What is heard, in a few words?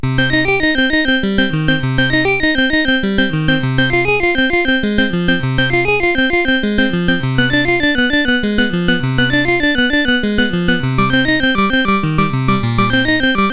Little Prelude, CSG8580R5